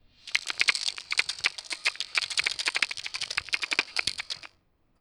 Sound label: Insectoid Monster Clicking